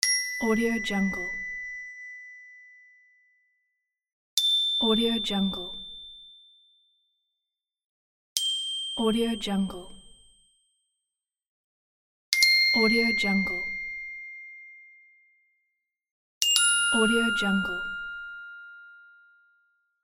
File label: gold shine